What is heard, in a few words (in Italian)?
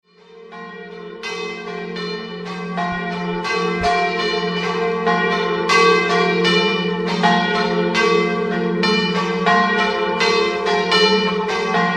campane; COLLALTO